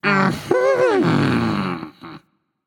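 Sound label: nonverbal agree; smite; ratatoskr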